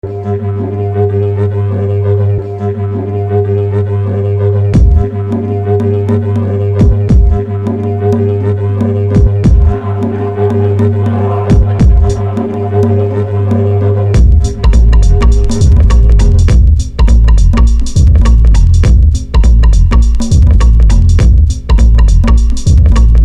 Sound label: digeridoo electro